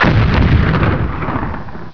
hit target, explosions

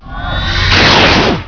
fire warhead, weapons

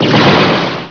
fire pulse; xshipwars

